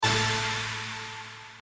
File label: Fast Line; Holy Goat; winline